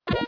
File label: Hud Tip Appear; Rabbids Go Phone Again